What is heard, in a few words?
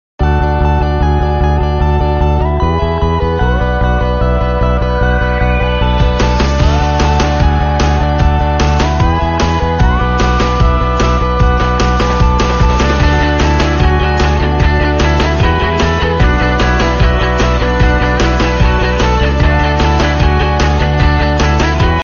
nokia lumia ringtone